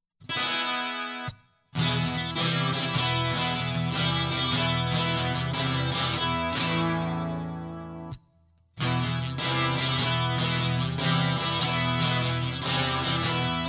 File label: Guitar Loop